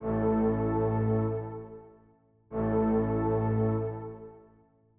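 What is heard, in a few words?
Strings A, Hip Hop Exotica, 096 A minor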